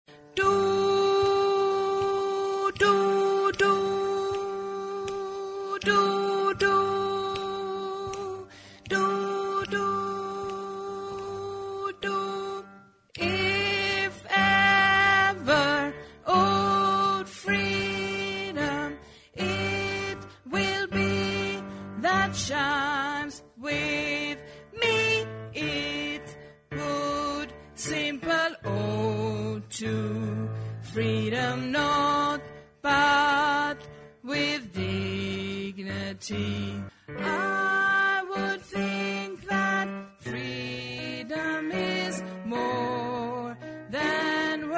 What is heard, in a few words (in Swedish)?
bas, stammor